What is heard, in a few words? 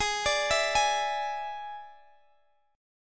E 7th G sharp, guitar